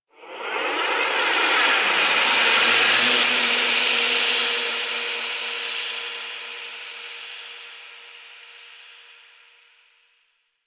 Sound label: Chamber Whisper; Orokin; Warframe; Sacrifice